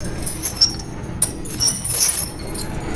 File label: metallic chain squeaking and moving